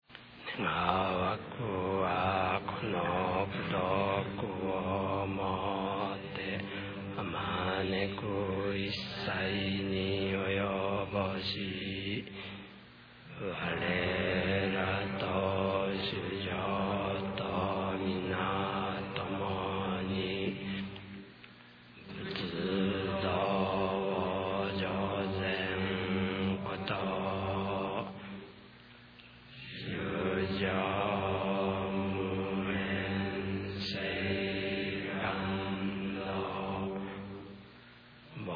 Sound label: end chant